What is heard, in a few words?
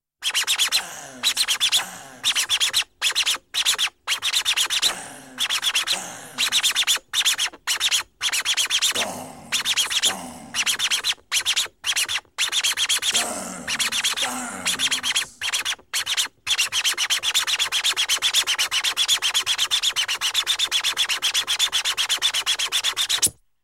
Jingle